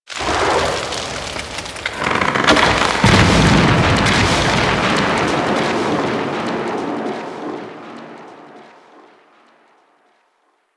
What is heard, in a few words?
Forest Distant Tree Fall; Titania Quest